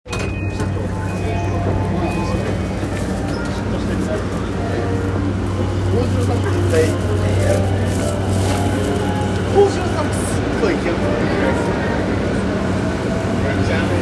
JR山手線日暮里, train sounds

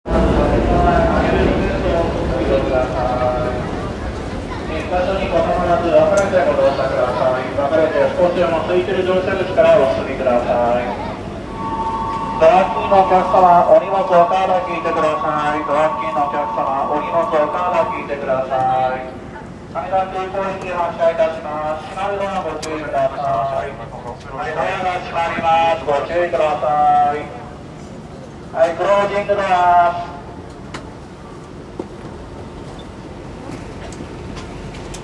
京急本線品川南

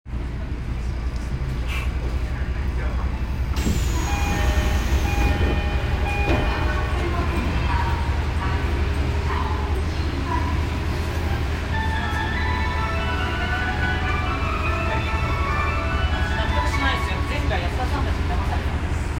有楽町線飯田橋東, train sounds

